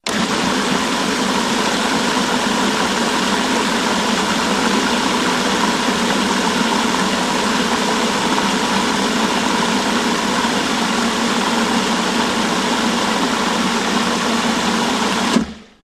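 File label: Food Processor Start; appliances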